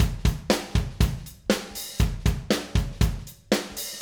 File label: Acoustic Live Drum